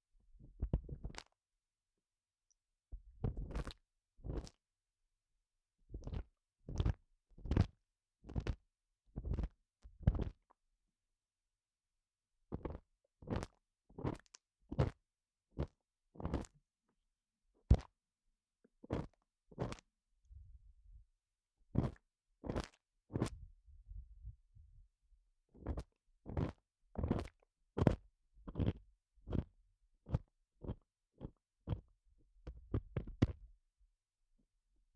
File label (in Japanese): チタン製耳かき 耳奥, 音だけ 声なし; 耳奥VER